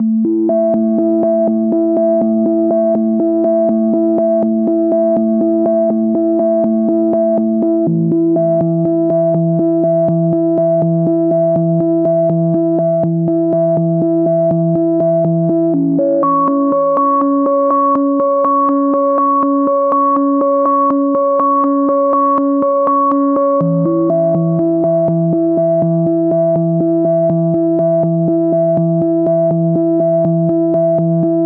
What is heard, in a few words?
122 BPM Melodic Arps C maj A min Tonic D; MMB